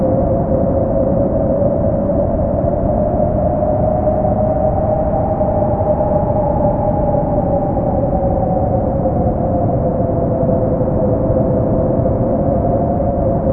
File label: stereo wind; Zero-G Ambient 1; STEREO WINDS